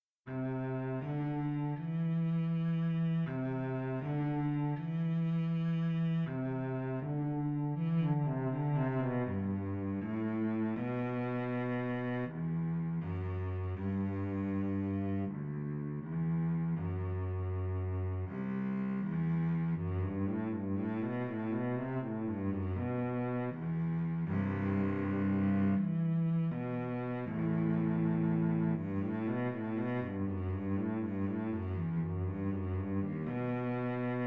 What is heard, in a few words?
Soliloquy for Violoncello